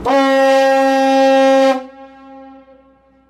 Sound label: Typhon, Helgoland